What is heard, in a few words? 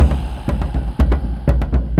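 Music Shield; From Dust; PS3; Xbox 360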